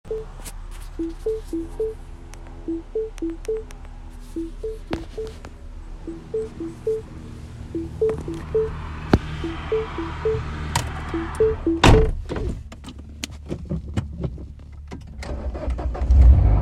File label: bottom end noise driving inside cabin; r56; hatch